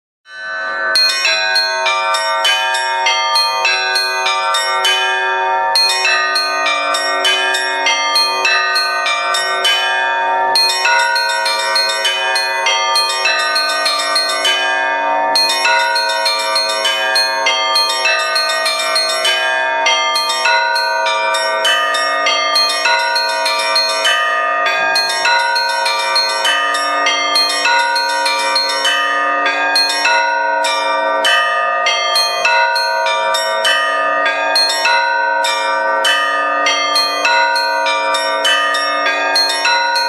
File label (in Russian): Perviy polieleyniy zvon na 9 kolokolah